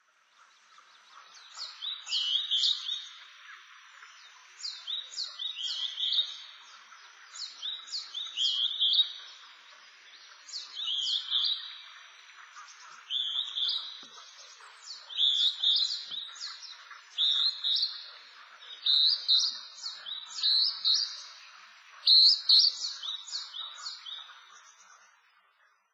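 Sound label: Northern Hawk-Cuckoo; juichi; shioya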